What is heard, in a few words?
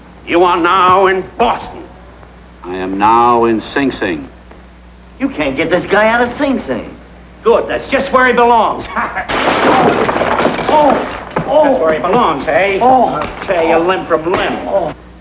sing sing